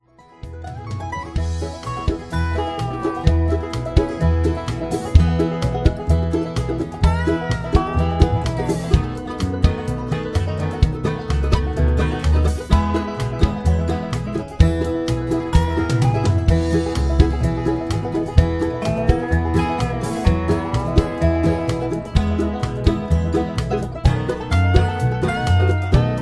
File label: no Melody